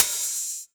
M-Audio Venom Open Hi Hat

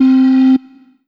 Yamaha TG500 KY Cali 1 C4

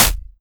Yamaha TX81Z Snare

